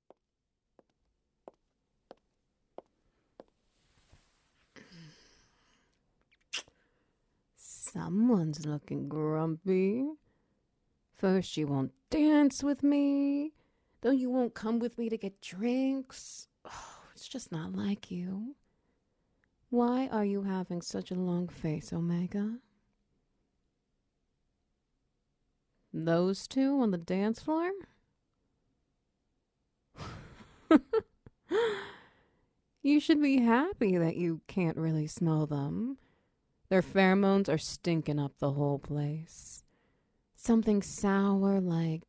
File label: Wet noises